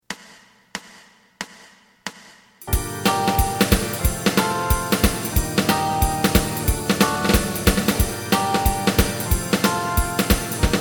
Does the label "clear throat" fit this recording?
No